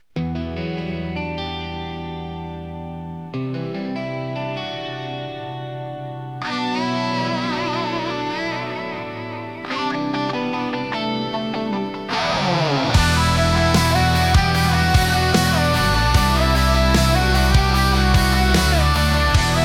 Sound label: rock ballad M; acestep